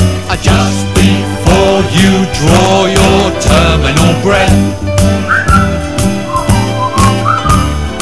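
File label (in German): ratten flöte; gimrats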